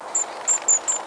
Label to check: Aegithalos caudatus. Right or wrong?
Right